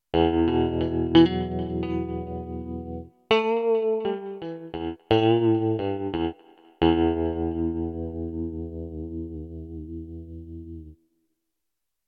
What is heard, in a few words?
Manyguitar Mix; a mix of some ideas